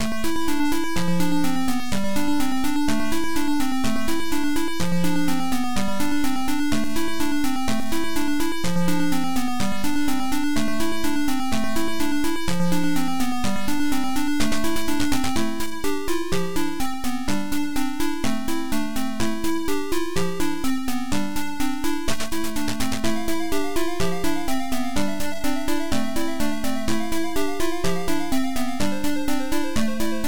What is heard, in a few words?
game music; Infosoft; Cyber mouse